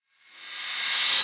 Reverse FX

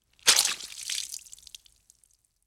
Blood Spirt Squirt Spray

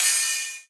House Ride, Cymbals; Drums; One Shots

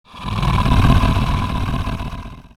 Creature Growl; Game Essentials

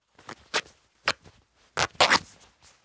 Tape Ripping; Fairlight Sound Lib

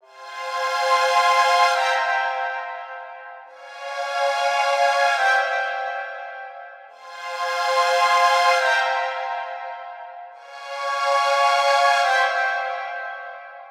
Riddim Melodic Loop F 140 Crunchy Strings; Ghosthack; Riddim Essentials Volume 2

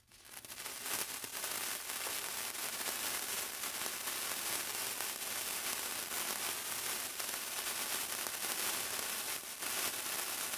Sparklers Sizzle Stamp; Fairlight Sound Lib

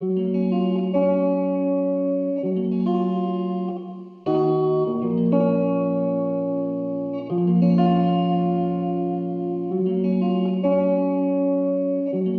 Nostalgic Melody Loop - 99 BPM F# Maj Guitar; Melodies; Loop Stems; Cymatics; Oracle Sample Pack

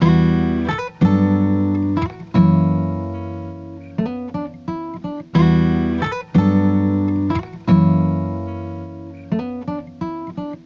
Acoustic Guitar Loop Dry - 90 BPM B Min; Melodics; Cymatics; Eternity Sample Pack; Guitars - Acoustic

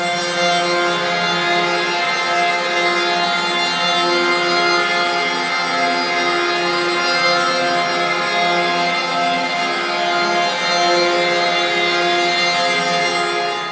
Pad F; Trap and Dubstep; Aurora

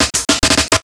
Riddim Drum Fill (140); Drum Fills; Ghosthack; Riddim Essentials 2020

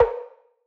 Vintage Percussion, Tone; Cymatics; Mystery Pack Vol 4

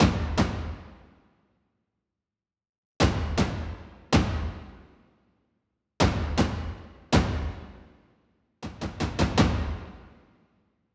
Mid Perc Loop, 80; Drums; Loops; Athos

